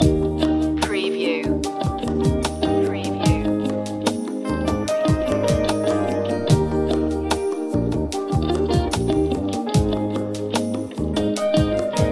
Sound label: sand loop